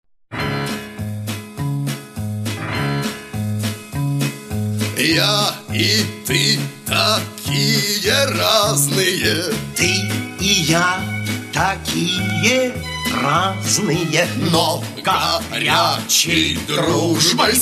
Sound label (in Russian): pesni dlya detey duet kota i pirata